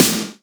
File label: Snares; Vengeance - Essential Clubsounds Vol.4